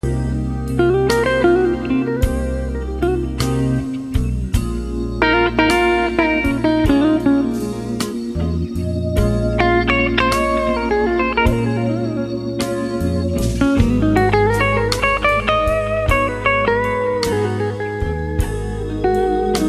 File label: Blues